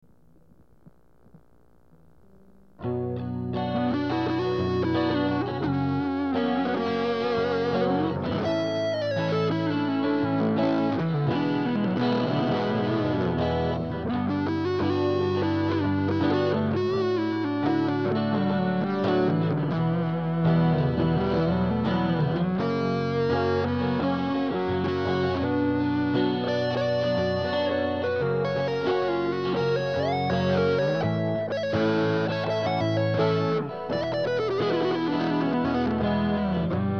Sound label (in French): guitare